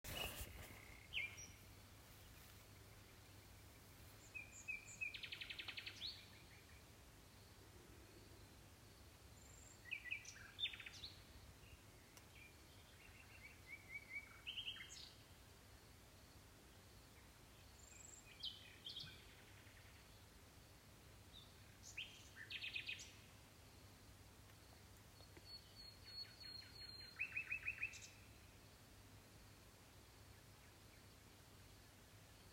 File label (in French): Oiseaux de nuit